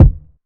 tight steel kick drum F sharp key, steel kick drum sample tuned to the F# note; kick drum samples